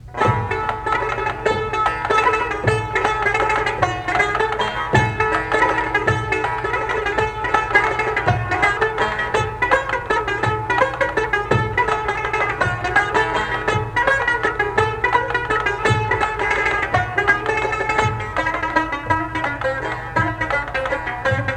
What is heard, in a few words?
Folk Ensemble, Azerbajian; Armenian-Caucasian